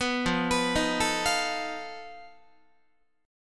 F 7th Flat 5th F down Guitar; F7b5; arpeggiated; 14,13,14,13,13,16

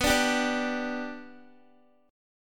B-Minor Sharp 5th, Guitar; strummed

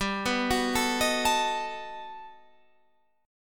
G Augmented Major 9th, Guitar, Standard; G+M9 arpeggiated; JGuitar

